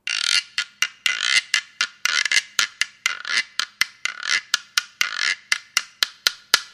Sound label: guiro